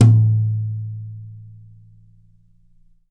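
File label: Yamaha 9X13 brush, left, drums; Big Fish Audio; Ross Garfield Drums VOL-2; T-YAM BRUSH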